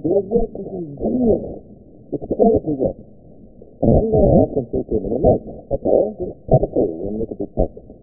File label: downsample by 8